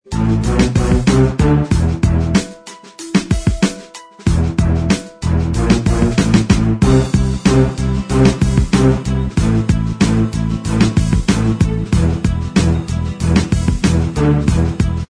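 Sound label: Inst Track, instrumental track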